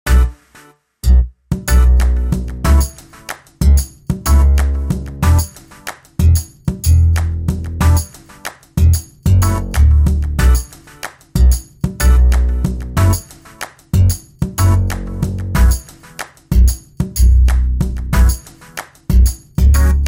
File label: funky beat